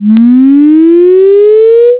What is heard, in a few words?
sine